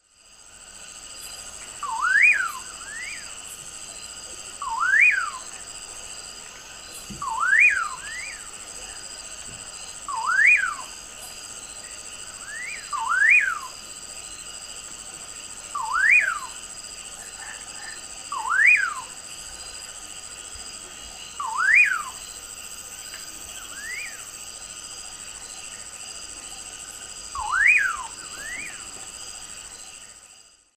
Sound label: Nyctidromus albicollis, songs 2 birds; S. America; Eastern Lowlands